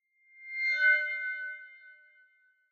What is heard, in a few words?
Megaphone Feedback Reverb